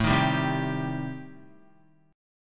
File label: A-Minor 7th Flat 5th, Guitar, chord; strummed; JGuitar